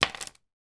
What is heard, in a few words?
technology earphones put down on table; sound effects one